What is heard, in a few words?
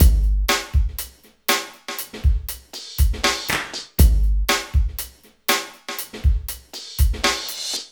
120, LOOPS, Dance Trax